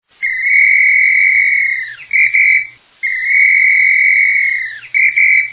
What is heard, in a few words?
Whistle